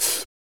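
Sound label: vox percussion, Vocal Planet Jazz FX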